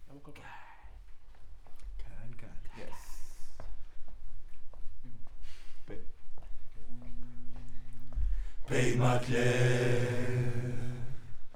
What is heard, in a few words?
Choir